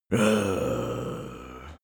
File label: zombie moan idle